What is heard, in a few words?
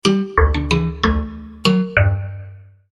Game over sound